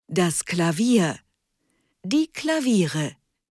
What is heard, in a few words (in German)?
Klavier